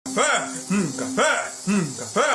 hum cafe

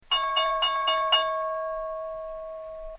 stock exchange opening bell

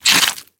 axe from body

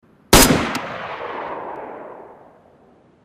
single m70 gun; dayz

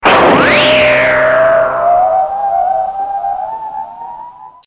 sword kill

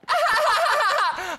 ahaha; ninja

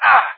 hurt sound; stormtrooper; lego star wars

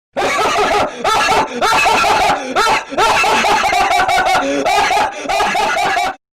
laughing loud

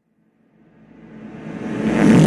fart with reverb but its reversed

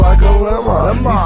alert

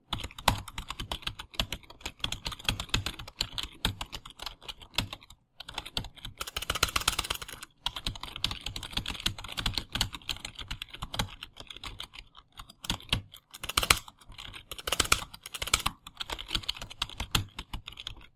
typing intensifies

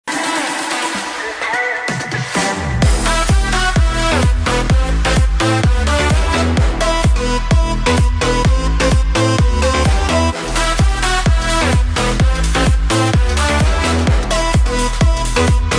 song

